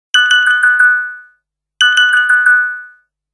Radar; Nokia Tones; Windows Phone apps

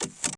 camera shutter; U250; LG

